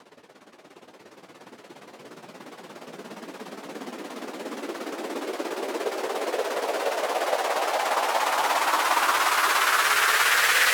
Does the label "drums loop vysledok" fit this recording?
No